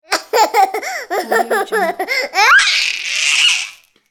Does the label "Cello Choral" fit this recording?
No